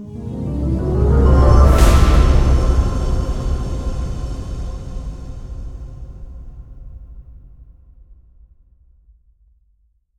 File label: Titlecard Impact; Twilight